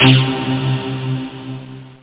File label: Chiffer Syn